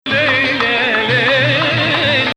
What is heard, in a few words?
Sikah Jadaka Rast